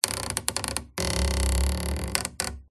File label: Stress Creak Squeak Groan; PMSFX